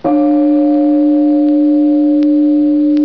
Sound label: bell sounds